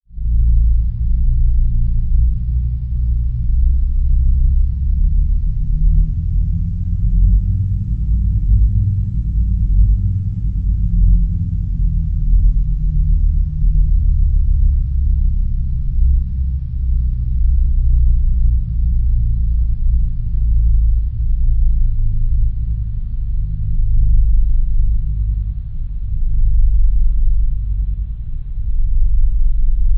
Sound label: Low Spaceship Rumbling